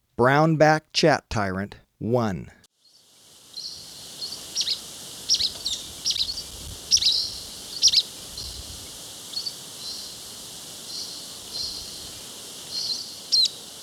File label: Brown-Backed Chat-Tyrant, Birds of Ecuador; S. America